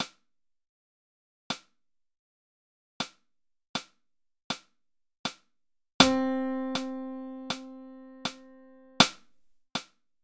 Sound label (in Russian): Пример целая